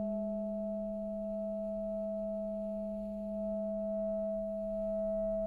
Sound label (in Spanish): Multiarmonicos